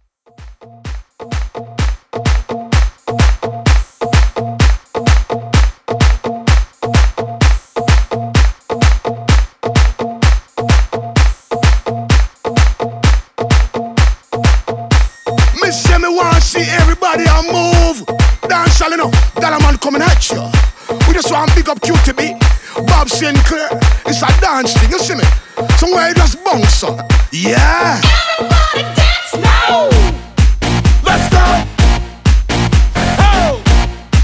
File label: Re drum